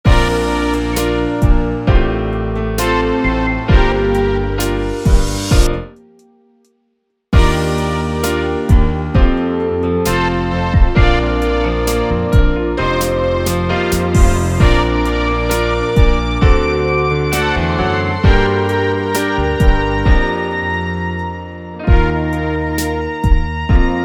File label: Instrumental